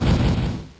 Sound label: Flame Wheel